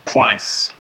counting the points score; tally

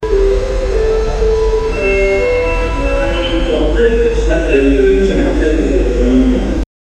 news station sound, broadcasting

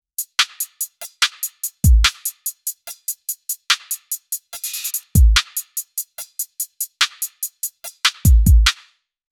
glitchy futuristic percu, hiphop

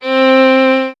a powerful final note pla, violin

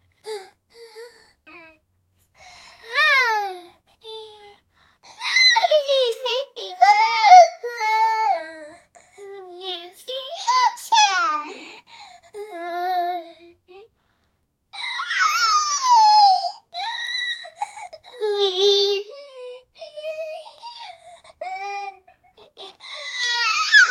year old girl giggling

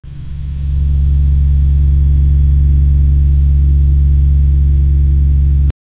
low continuous mechanical

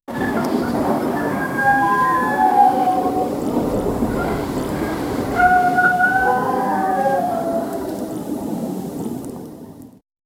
small coastal japanese to

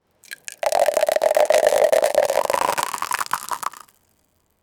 pouring milk and; beverages

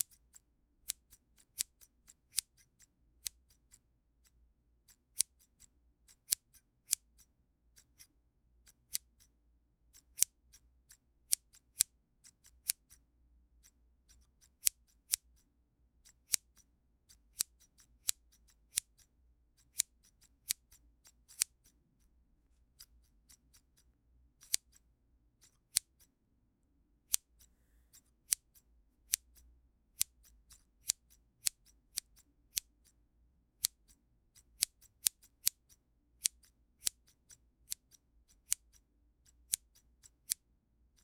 soft professional hair cu, haircut

